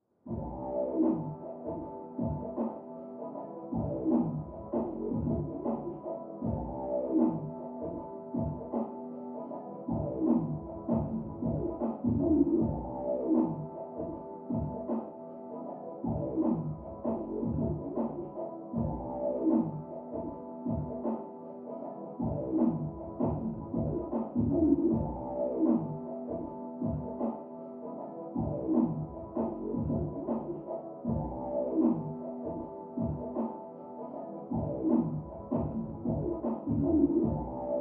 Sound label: Hip Hop Beat